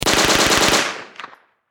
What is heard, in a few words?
Submachine Gun Mp40 German 9Mm Long Burst Distant Perspective; Warfare